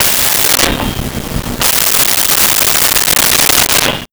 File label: Door Screen Creak Fast; Home & Office